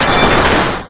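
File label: Battle Skill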